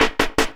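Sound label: LOOP snare drum, Drum & Bass, 160 BPM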